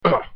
hit grunt

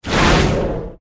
banner cast

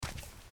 biped run

